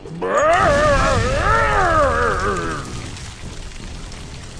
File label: burn; quake2; strongbad